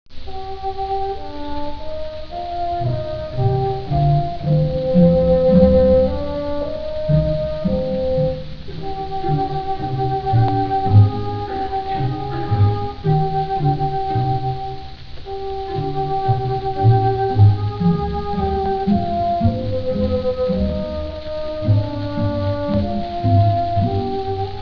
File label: bassoon